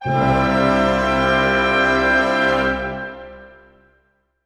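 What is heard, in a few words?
orchestra; Masterbits - Switch FX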